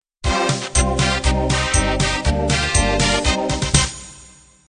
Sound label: 2beat 60-70s end